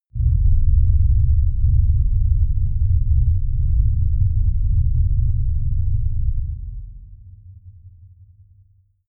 rumble

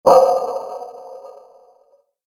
harmonic event

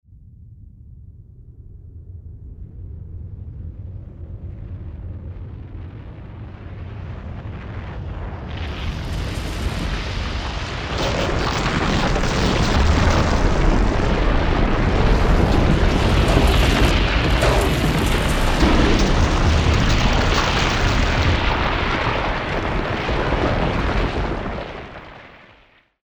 avalance bounce

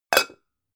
pan close hard

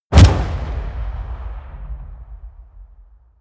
burst

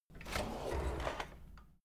drawer kitchen open loud

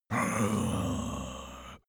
zombie moan idle

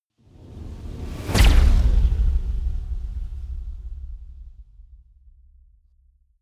sci-fi flyby plasma flutter

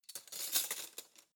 cutlery spoons drawer grab soft

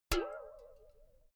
filled can hit ringing long

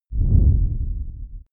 whoosh low distorted

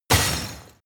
sword rattle hit

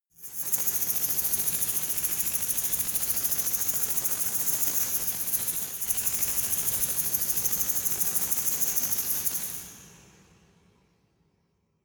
resonator rattle hard

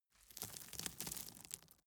gravel drop soft

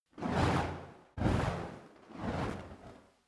fire torch move sequence

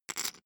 poker chip stack small rattle

